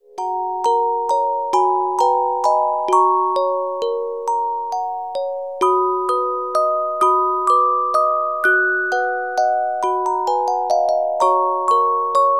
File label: Carillon Music Box Melodies